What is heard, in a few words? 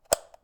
button release; SimplyGladiators